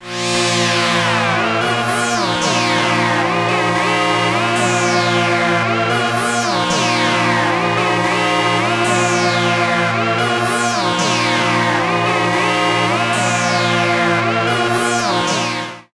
korg minilogue space sound

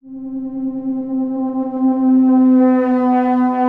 pad; synplant

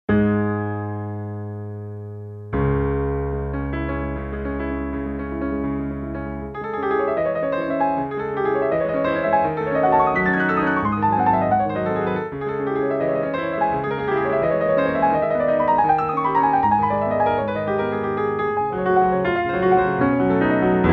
alesis prestige artist

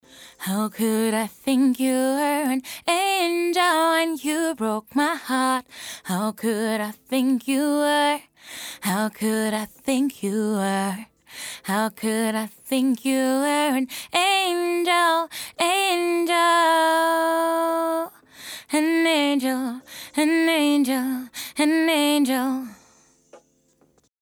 e2deesser Pop Vocal; eiosis

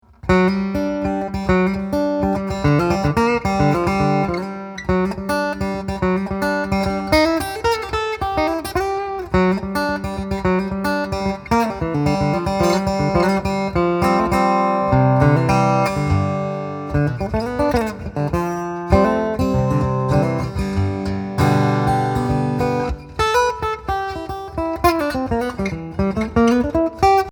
Angel 3 Country Picking, Westerngitarre; Cole Clark

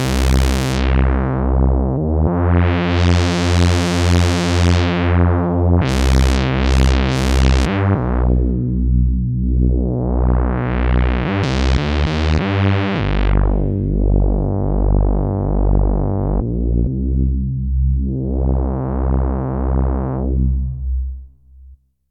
bass; yamaha mx49

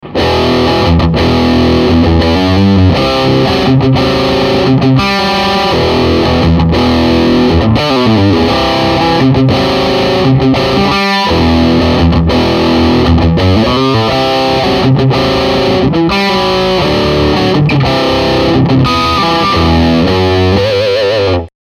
Orange TH30C dirty; orange th30c-bk

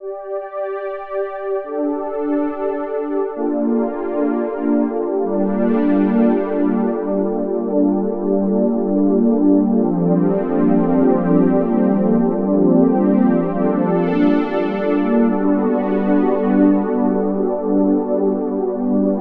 Yamaha MX61 pad

